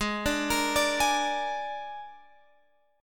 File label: Ab Suspended 2nd Flat 5th Ab Guitar Open G